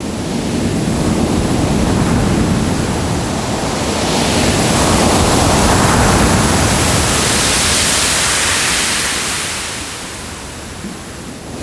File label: Waves; ambience; pet rock